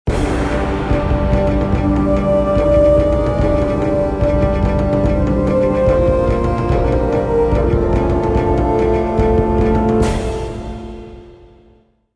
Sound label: Ancient Combat; Civilization Revolution